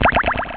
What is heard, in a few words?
Dripity Beep; Realmz